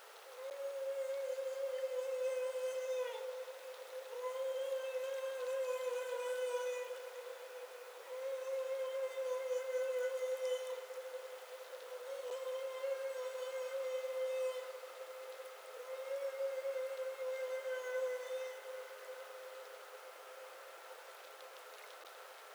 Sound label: Feeding Call